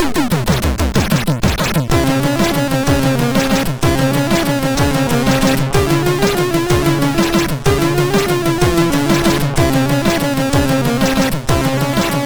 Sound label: Iranian Music, sid MOS6581R3